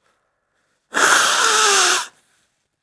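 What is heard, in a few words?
nose blowing sound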